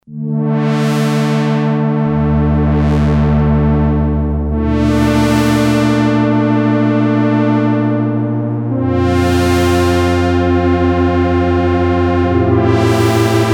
String with Filter sweep, Sequential Circuits Six-Trak; Factory Programs